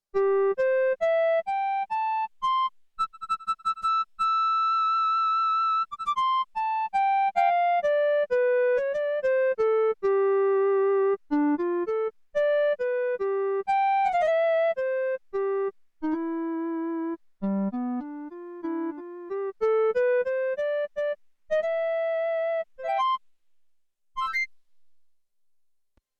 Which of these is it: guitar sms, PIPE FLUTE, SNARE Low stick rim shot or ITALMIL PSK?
PIPE FLUTE